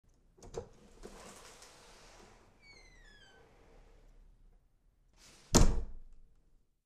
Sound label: wooden door opens and closes with creak